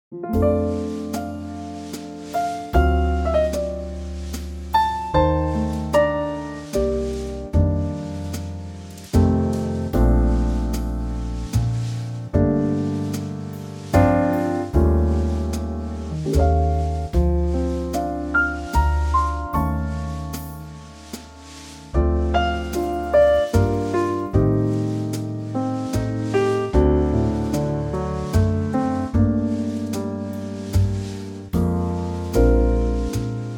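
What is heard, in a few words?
Bb trio, Backing Tracks for Male Vocals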